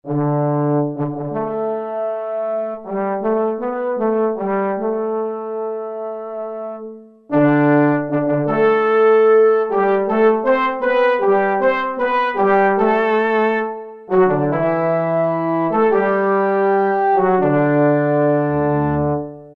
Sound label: BR FrHorn, Yamaha TG77; Factory Presets